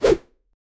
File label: quick mystic air whoosh